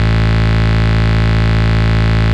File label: Bass Root A